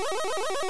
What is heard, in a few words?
charge sound; mbuster